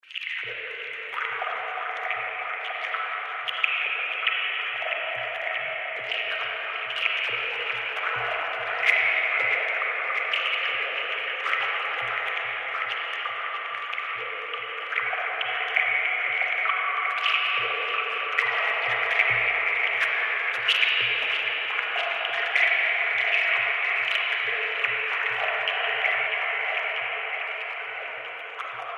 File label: Cavern Ambience; Scary Soundscape Sound Effects; fantasy sound effect